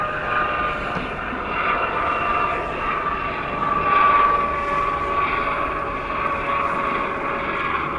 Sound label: air